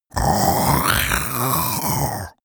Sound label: monster choking zombie; koerpergeraeusche; comedy-cartoon; soundpool